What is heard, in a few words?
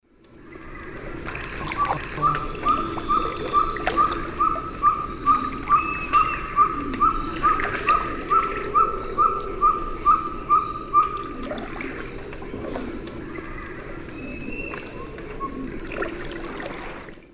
Glaucidium brasilianum, song; S. America; Eastern Lowlands